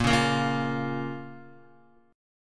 Bb Minor Bb down Guitar; strummed; JGuitar

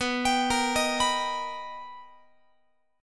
B Augmented Major 7th B Guitar, chord; B+M7; arpeggiated; x,19,22,20,19,22

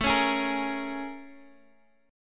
Bb Minor 7th A; Bbm7/A strummed

